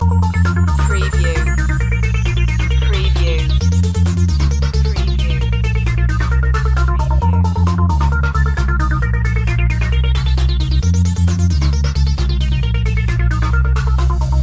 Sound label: energetic playground loop